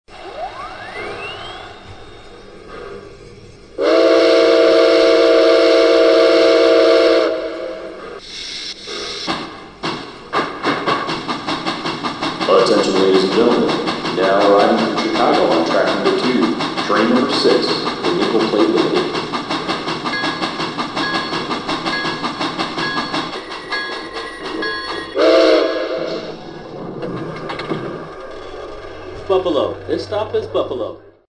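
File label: Berkshire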